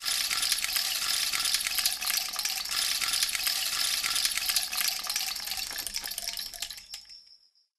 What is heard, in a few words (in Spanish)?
Palo de Lluvia